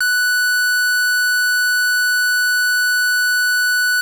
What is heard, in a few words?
square, 808, SubOsc